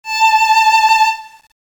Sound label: violin gun draw